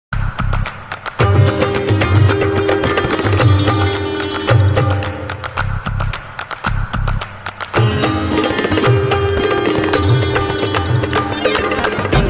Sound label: instrumental